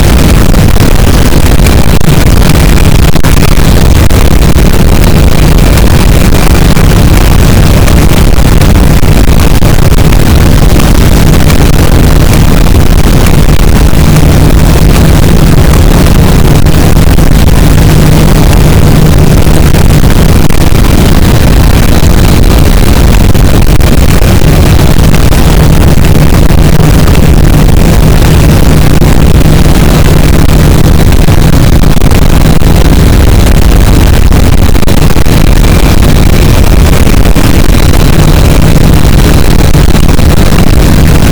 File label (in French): cachalot positif, boat; Physeter Macrocephalus